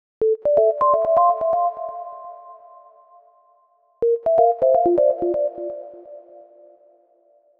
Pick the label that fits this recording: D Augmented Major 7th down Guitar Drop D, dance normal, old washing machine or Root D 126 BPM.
Root D 126 BPM